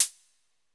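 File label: stereo hat closed, hi-tech kit; Crypton - DrumBase5000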